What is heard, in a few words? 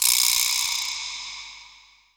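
vibra reverb, vibra slap; Cuba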